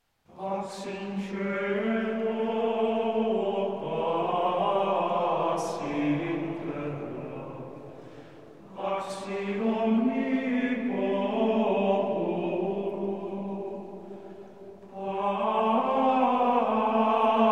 Ambrosianischer Choral - Officiorum - Psallenda, Gregorian chant; Pax in caelo